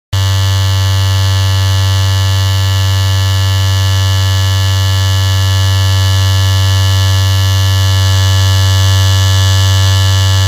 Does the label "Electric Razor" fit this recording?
Yes